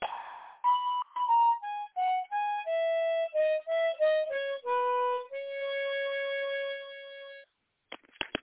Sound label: descending minor melody harmonica